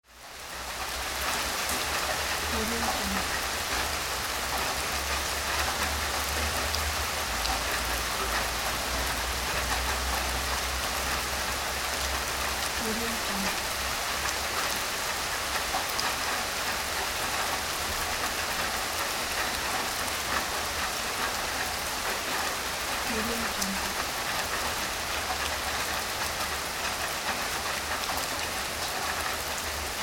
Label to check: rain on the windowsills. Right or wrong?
Right